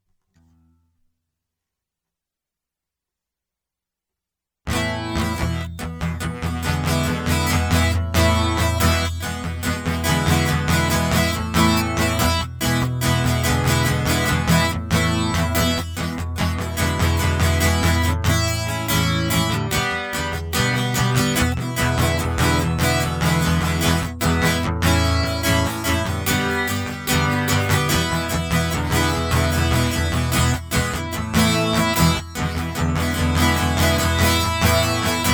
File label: Guitar Loop; hyper terrestrial; Chalk It Up